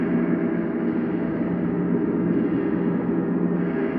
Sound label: sparse soundscape samples; ReLooped